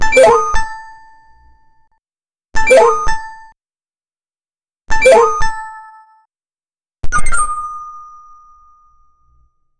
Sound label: logo reveal fun; 6s